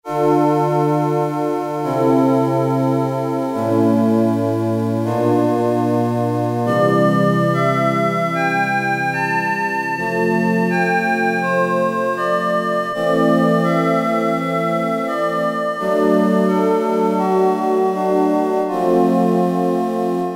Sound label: Baad Breath, Yamaha TX81Z